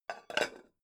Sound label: pan close chink soft